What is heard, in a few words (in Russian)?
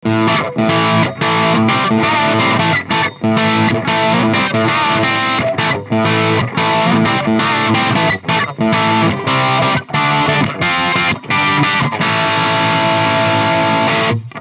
Запись микрооконечника через кабинет и микрофон; Микрооконечник для игры в линию версия 2; Southern LP